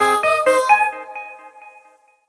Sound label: notification ringtone iphone sms